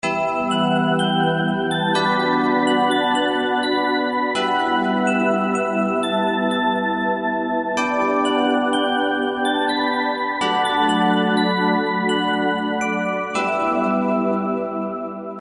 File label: superb ringtone